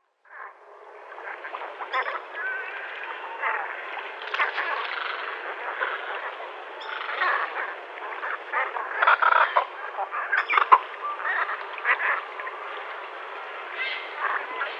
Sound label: Black Heron